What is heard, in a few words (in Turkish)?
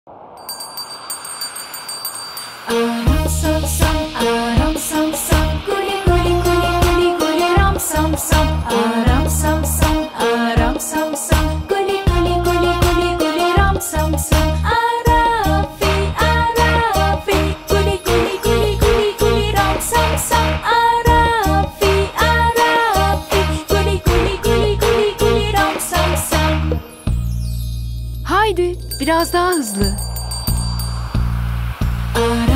eglenceli cocuk sarkisi children dance song; a ram sam sam